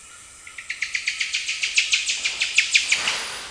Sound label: bicolor, Presbytes bicolor